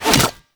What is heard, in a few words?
Infested Fishing Spear Line Retract Catch; Weapons